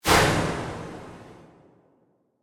Created Sweep Pulse Reverb, various sweeps, pulses and drones; Sweeper; Sound Design; SoundEffects Foundation2